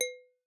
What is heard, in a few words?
Glass